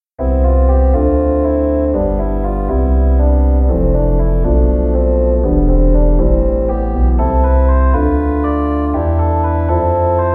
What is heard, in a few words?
Postludium 7-8